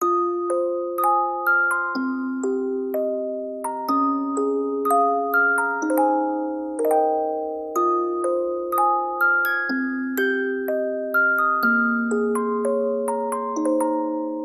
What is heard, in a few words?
song musicbox; lostlab